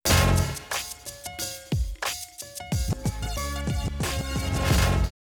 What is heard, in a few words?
Music; 2 Guns Sneak Peak